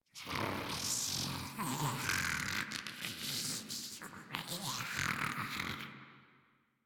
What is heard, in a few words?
Breathing; Grineer Ghoul Expired